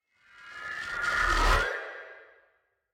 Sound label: Shock Reverse; Pineview Drive